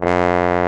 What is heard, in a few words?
BARITON; Best Service; Alpine Volksmusik